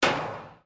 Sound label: gavel